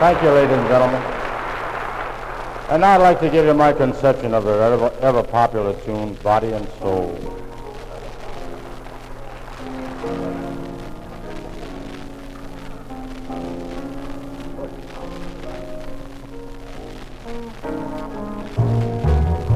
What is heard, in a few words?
Live At Symphony Hall, With Applause